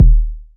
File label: BD, Waldorf Blofeld